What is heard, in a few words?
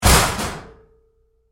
Door Locker Close Roomy Hard, Metal Door; Door,Locker,PK1; Metal Doors